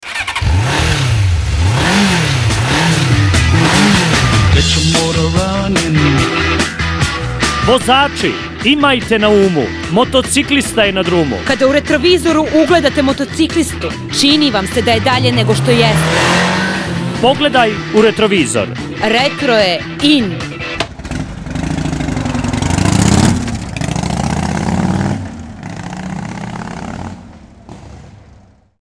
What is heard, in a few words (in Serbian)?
radio džingl; motocikli